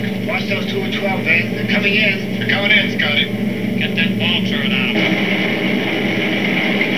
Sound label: B17 Start Windows; Vehicles; DesktopThemes2000